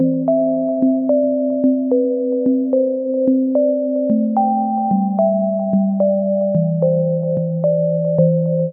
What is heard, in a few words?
Melody Loop, 110 BPM C Maj; Synth Loops; Cymatics; Light Free Sample Pack; Bonus Samples